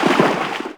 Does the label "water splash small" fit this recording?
Yes